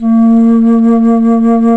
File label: FLT, Bass Flute, BsFlt vib; Roland L-CDX-03 Disk 1